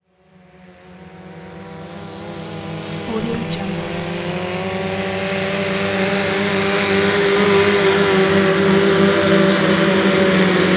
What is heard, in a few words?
swamp buggy pass by; prosoundfx